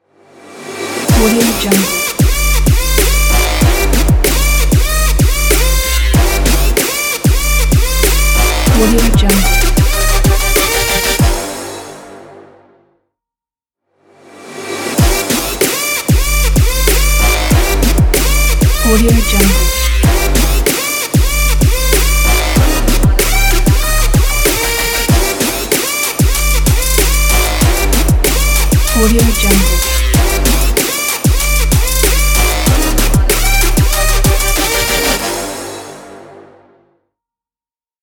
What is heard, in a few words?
trap logo